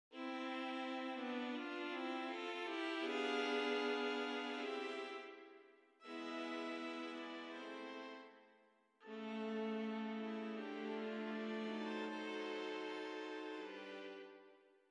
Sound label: Viola Quartet; Ah Vous dirai-je Maman